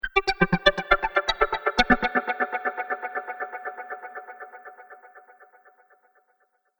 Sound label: Space Sparkles, sci fi sound effect; Andrew Fleming